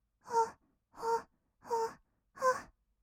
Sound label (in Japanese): ほっほっ, kawaii voice, 合いの手